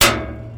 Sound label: hit